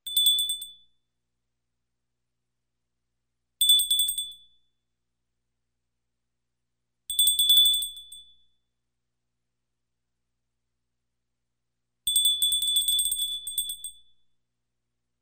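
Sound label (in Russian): звон маленького колокольчика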